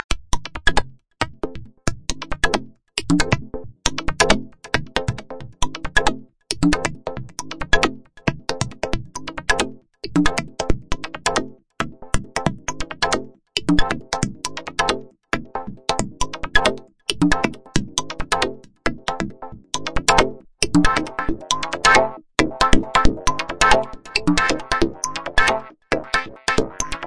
echoey groove techno

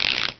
Ratchet

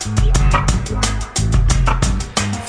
090 funk bass n drums

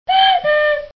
Obnoxious Whistle

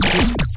Electronic Hits

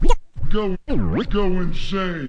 turntable INSANE, Scratching and Turntablism; DRUM LOOPS and BREAKS

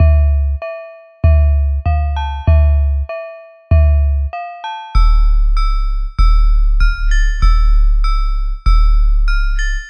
ready made nasty rap; SAMPLED MUSIC LOOPS

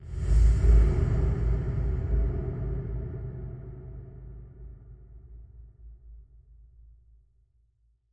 drumsplosion hollow boom

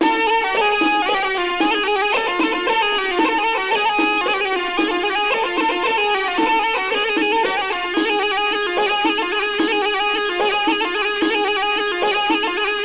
mideast melody and hand drum; SAMPLED MUSIC LOOPS

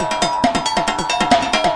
bells on nitrus oxide; SAMPLED MUSIC LOOPS

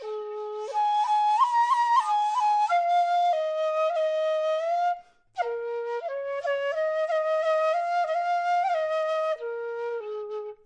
bamboo flute melody; SAMPLED MUSIC LOOPS

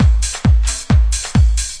133 bpm, straight up house, drum loops and breaks

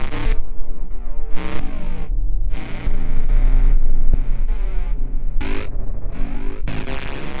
subwoofer monkeys distortion loop; Computery or Science Fiction

